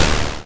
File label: pickup goal fake